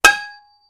Metal Impacts, Dull; Dull Impact With Light Ring Out. - Dish Impact; pipes